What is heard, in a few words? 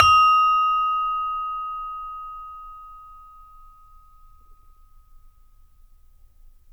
SARON D#2; Spectrasonics; Heart of Asia